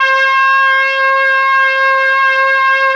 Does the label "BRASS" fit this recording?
Yes